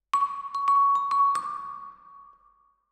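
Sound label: Vibraphone Close, Jazz for piano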